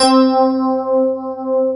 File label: FM ELP 4 C3 R, Keyboards Old School; USB Soundscan vol.09 - Keyboards Old School; AKAI